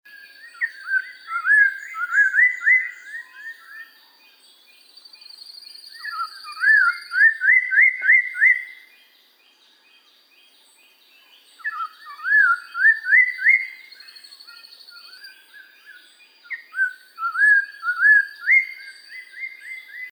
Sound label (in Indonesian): suara poksay mandarin variasi